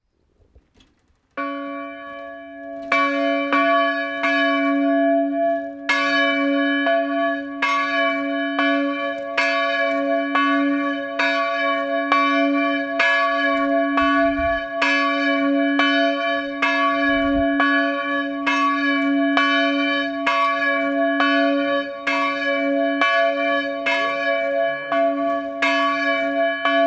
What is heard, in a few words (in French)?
Cloches La Villedieu